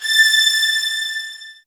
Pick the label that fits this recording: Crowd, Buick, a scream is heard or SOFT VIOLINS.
SOFT VIOLINS